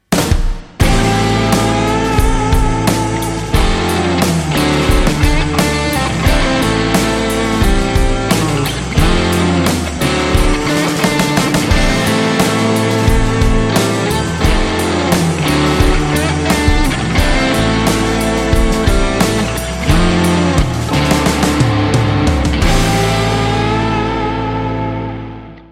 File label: Starter Blues Logo; blues intros